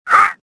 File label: jump